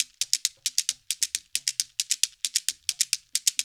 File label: 132 JU, African Contemporary Ju Ju; Spectrasonics; Supreme Beats